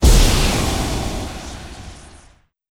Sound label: big orb explode; gg pure vessel; Hollow Knight